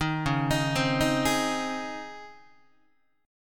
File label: C sharp Major 7th Suspended 2nd, Guitar; C#M7sus2 arpeggiated; 6,9,6,10,7,7; JGuitar